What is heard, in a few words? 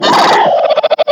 qurupeco roar enraged